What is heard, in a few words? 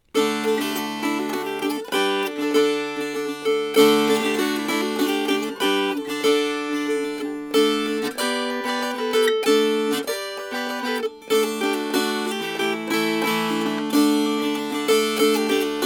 Fast